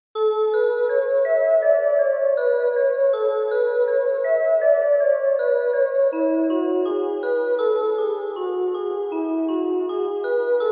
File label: spa music 432 Hz; prezentow czas